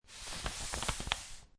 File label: Paper Slide, Slide paper, sliding paper on paper.; Classroom (Sound Effects:Schools)